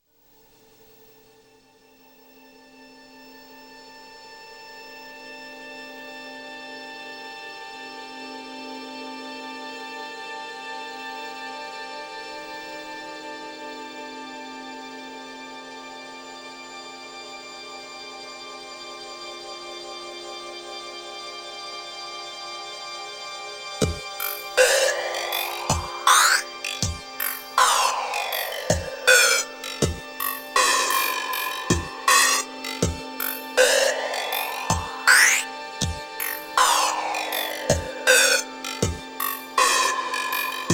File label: calm